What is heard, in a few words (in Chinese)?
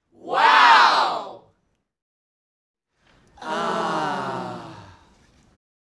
拟声(高兴)男声; renlei